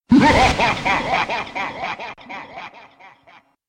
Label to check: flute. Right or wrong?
Wrong